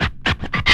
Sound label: SCRAT, VNL FX; E-Lab; X Static Goldmine 2; akai